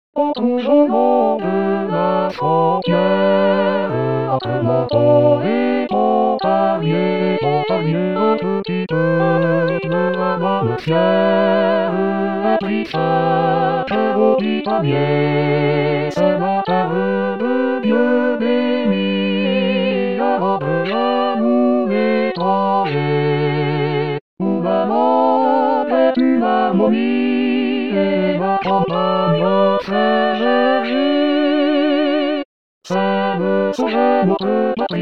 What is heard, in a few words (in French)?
3 voix